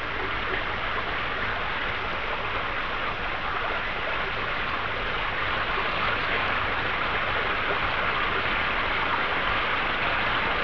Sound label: Water, 自然, 水声; 特酷声音素材库